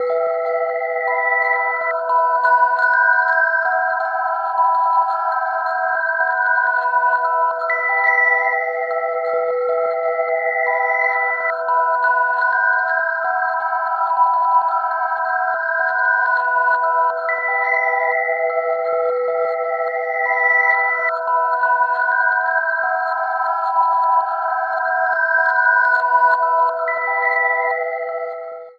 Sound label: texture trinkles E Min, One Shot Textures; Transmission-X